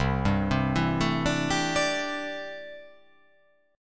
C 6th Add 9th C down

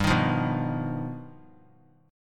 G Augmented 7th; G+7 strummed; 19,22,20,21; JGuitar

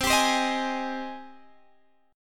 G sharp Major 7th C

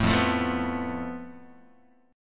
F Major Flat 5th Guitar, chord; FMb5 strummed; JGuitar

